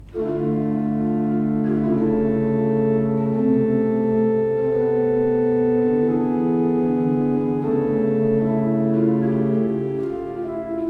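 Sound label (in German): Orgel, pfeifenorgeln; Seifert Kevelaer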